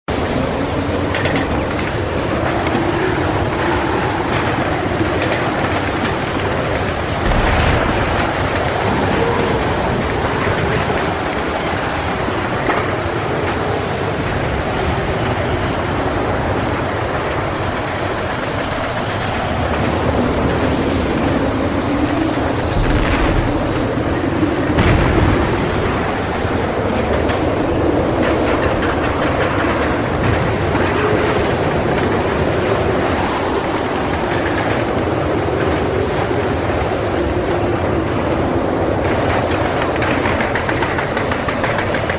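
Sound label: desert, ambience stereo; mohaa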